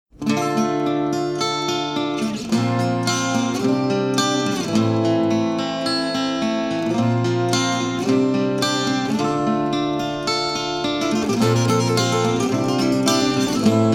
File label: acoustic version